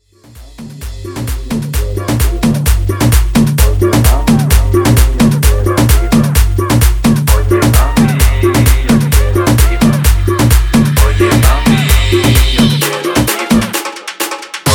intro outro 130 bpm

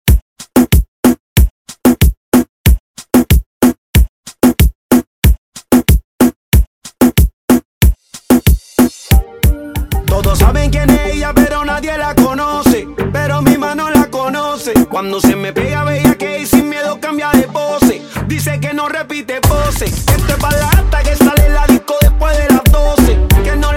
Intro 93 BPM

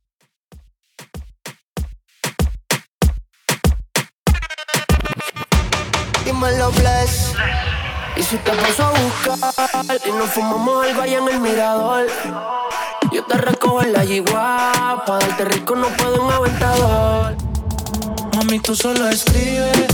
intro outro 96 BPM

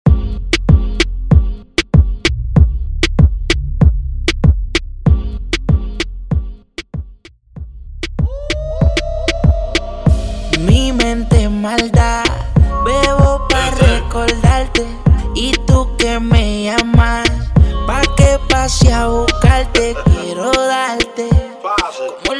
Intro Outro Segway 96 BPM

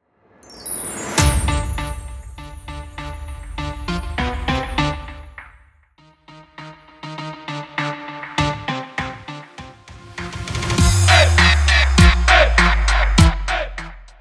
Intro Open Show Navidad 100 BPM; Prodeejay Remix

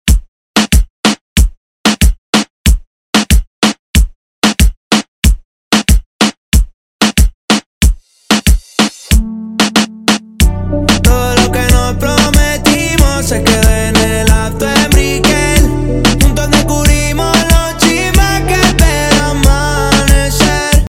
REGGETOM RMX

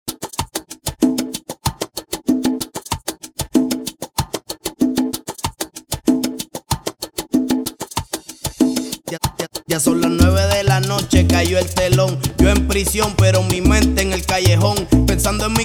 House Remix bpm 95

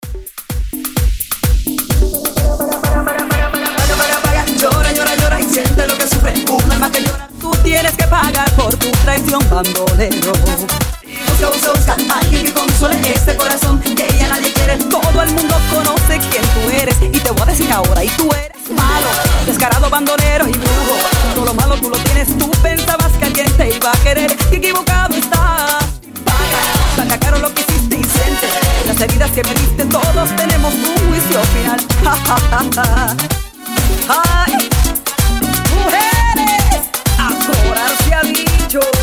intro outro 128 bpm

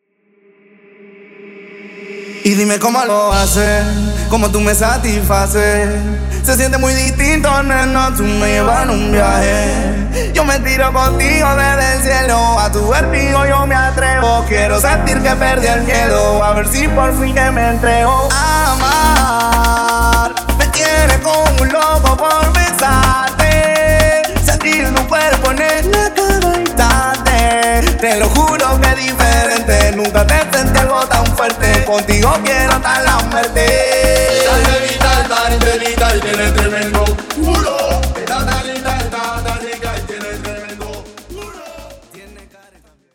intro outro 124 bpm

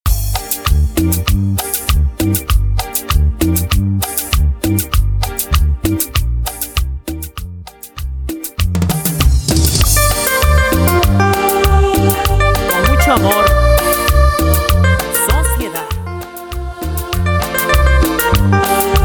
Intro Outro 98 BPM

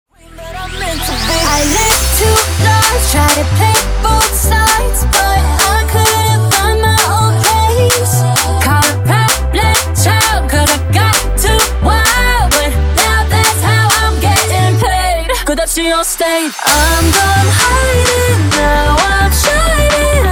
intro outro 130 bpm

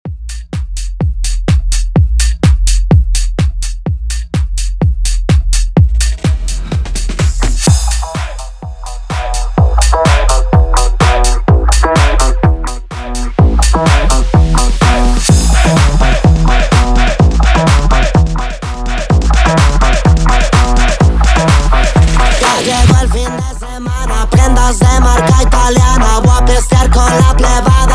intro outro 126 BPM

